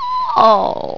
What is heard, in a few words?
OOAW; PEOPLE